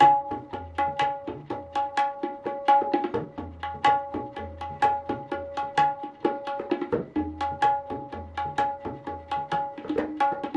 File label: tintal theka drut layah, kathak; tempo-layah